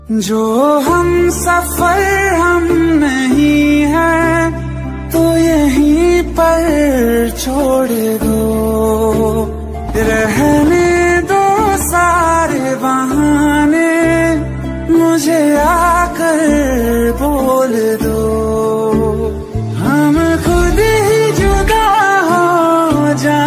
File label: Sad Song Ringtone